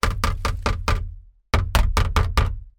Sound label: plastic dustpan hit side of inside plastic trash can; household